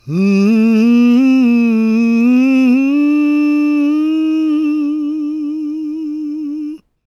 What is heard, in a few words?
GOSP MALE, SOLO